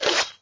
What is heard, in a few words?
Box swipe